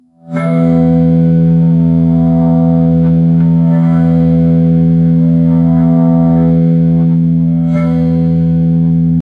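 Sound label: robot deploy instant electric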